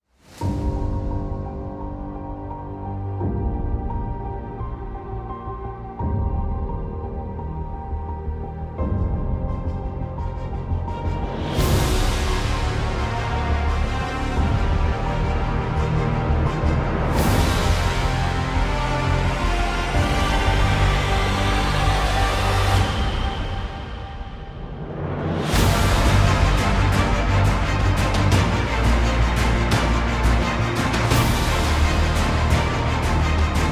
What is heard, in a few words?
Cinematic Dramatic Epic Heroic Trailer